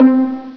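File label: PIZZICATO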